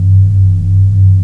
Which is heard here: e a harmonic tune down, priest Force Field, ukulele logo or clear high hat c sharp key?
priest Force Field